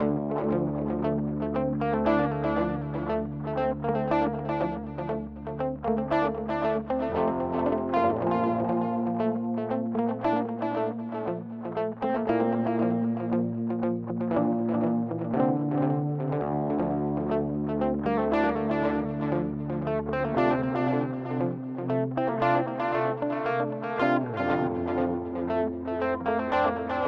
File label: Zoom G11 Dotted Crunch